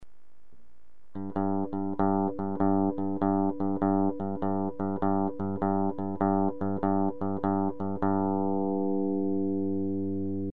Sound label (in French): sol, basse